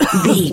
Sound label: voice male cough short